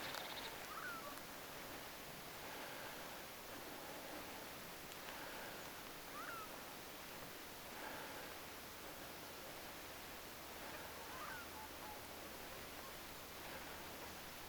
kyhmyjoutsen aantelee

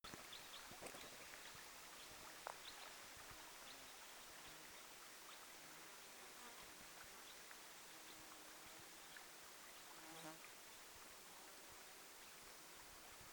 pikkukapylintuparvi

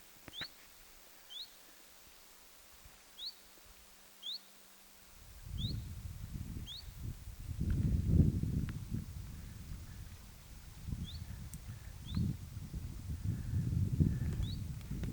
zuit vaiko hyit-tiltaltti

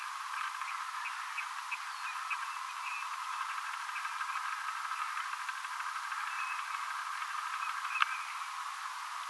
ilmeisesti telkkapari laskeutuu yhdessa liitaen kovaa vauhtia siipien aania; kuuluu tuollainen erikoinen ääni?; Hiljaista ja vähän oli tihkusateista.

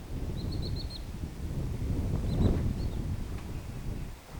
tuollaista lirolinnun aantelya

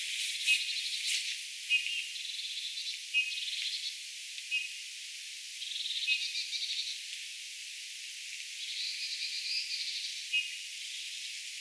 punatulkku aantelee

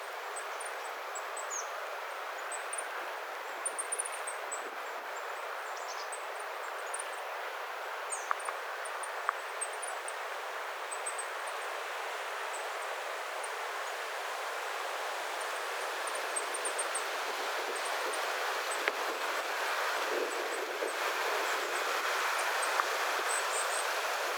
hippiaisten innokasta aantelya hippiaisen

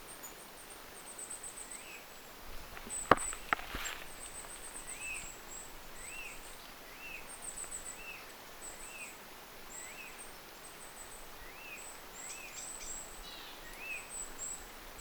tuollaisia ilm nuoren punatulkun aania

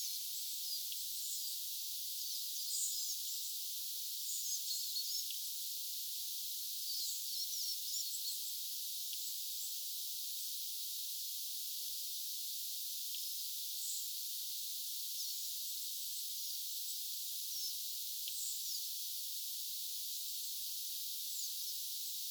olisiko tuo nuori keltanokkarastas tai sinihohtorastas

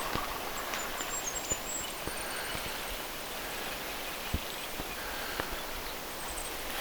tuollaisia sepelkyyhkyparin siivista kuuluvia aania erilaisia hiukan kuin tavallisesti; hiukan erilaista sepelkyyhkyn siipien lentoääntelyä

